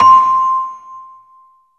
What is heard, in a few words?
CELESTA, JV-1080; Syntec; Wall of Sounds VOL-2; ORCHESTR-EXP